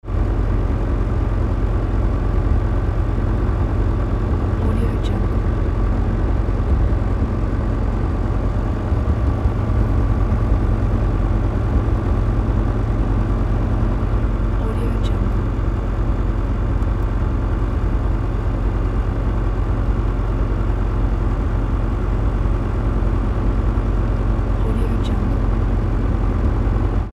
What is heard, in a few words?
propeller airplane engine idle interior